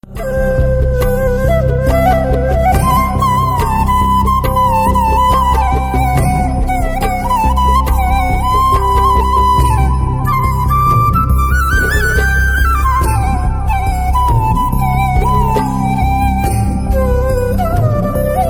bg flute ringtone; tamil ringtones; peranbu

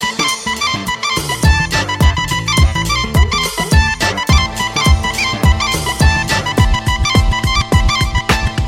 bg tone; tamil ringtones; comali

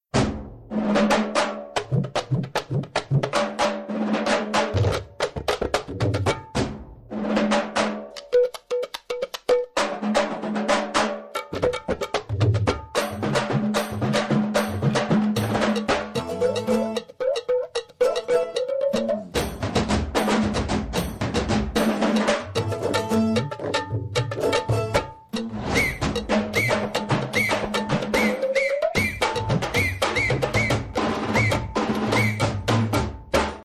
drum beats ringtone; tamil; kanchana 3

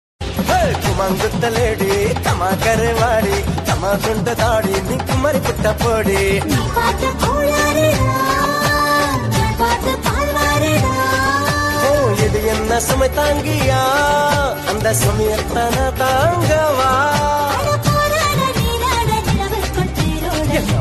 best music ringtone

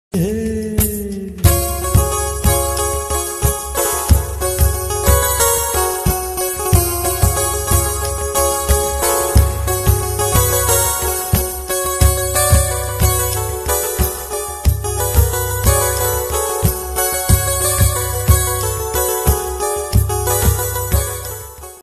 ringtone

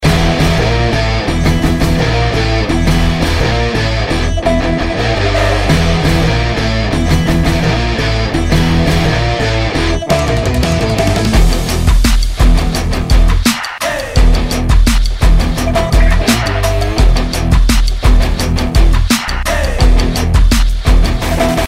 theme music; tamil; vivegam

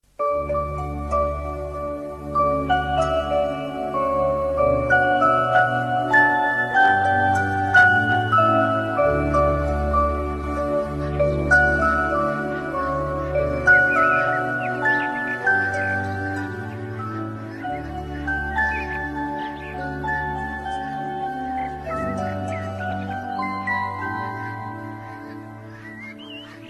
indian bgm

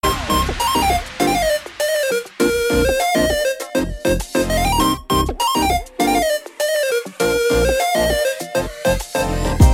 love bgm; tamil; capmaari

